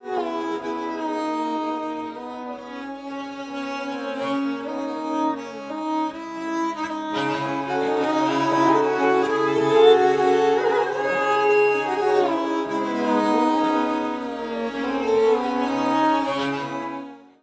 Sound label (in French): ethno violons